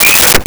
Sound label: Walkie Talkie Feedback; Communications